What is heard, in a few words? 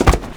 bail body fall; skater; Tony Hawk's Project 8; XBOX